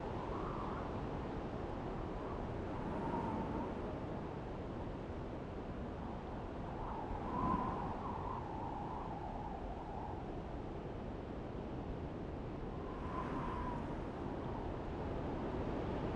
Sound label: Ambiance Siberian Exterior; Iron Man 2 iOS gamerip